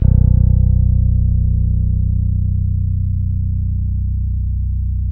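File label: fretless bass; Spectrasonics; Bass Legends; 6-STR FRETLS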